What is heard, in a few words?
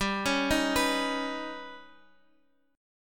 D sharp Augmented 7th G